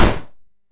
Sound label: gate close; Puzzle